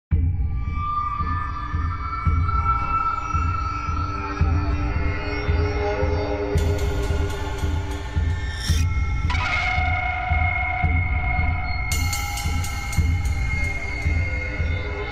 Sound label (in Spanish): musica de terror para creepypastas